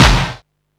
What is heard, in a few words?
snare, techno 138 BPM